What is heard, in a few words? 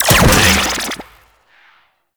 Shock Rifle Primary Shot, Weapons; Warframe; Corpus; Shock Rifle Vandal